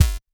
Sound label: One Shot, Hits